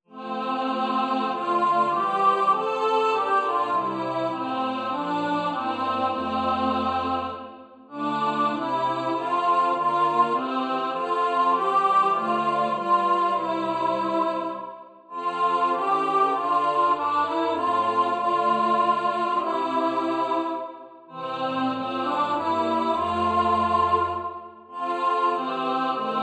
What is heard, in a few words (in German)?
3 stimmig Alt